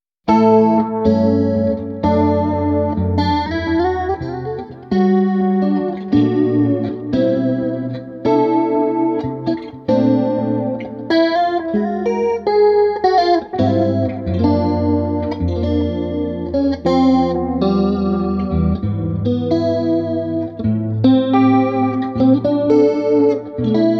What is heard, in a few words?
WaterFall Delay; Delay Llama